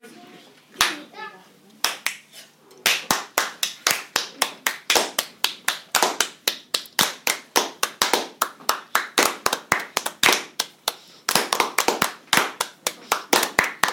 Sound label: rhythm grid